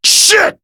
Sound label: Damage; BBTAG